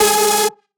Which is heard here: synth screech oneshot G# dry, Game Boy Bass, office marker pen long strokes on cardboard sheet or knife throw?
synth screech oneshot G# dry